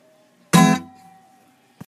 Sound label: acoustic E chord